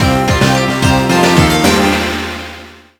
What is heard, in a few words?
Stage Completed; American Dragon Jake Long Attack of the Dark Dragon Prototype